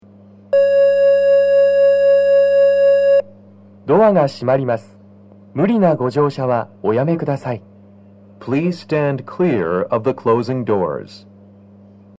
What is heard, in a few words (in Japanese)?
発車サイン音 「営団ブザー」; 3番線 西船橋・東葉勝田台・津田沼・三鷹方面